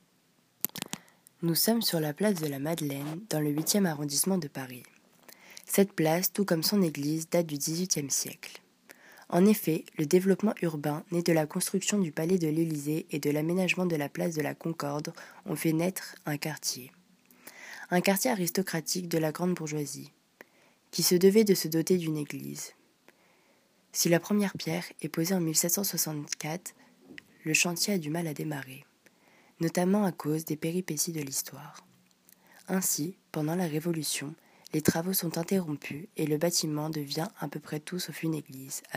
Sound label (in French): Vocal